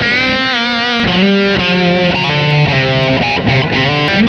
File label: guit lead; Sonic Foundry (Sony Creative Software); Ugly Remnants VOL-2